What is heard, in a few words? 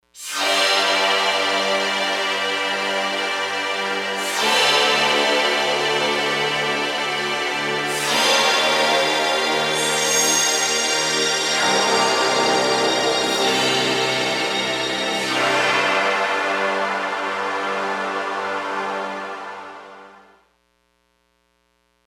Chino Filter; Digital Synthesizer